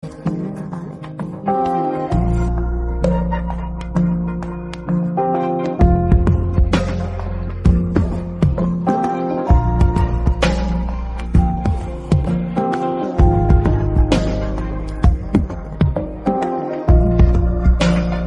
english instrumental

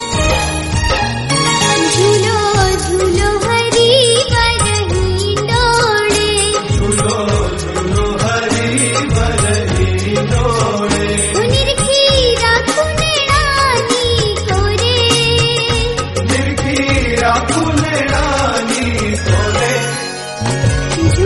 gujarati; shree swaminarayan mandir kalupur

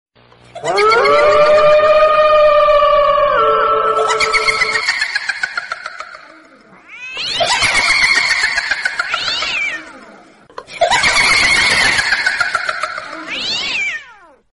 scary ghost laugh

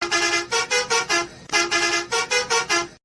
bus horn notification message

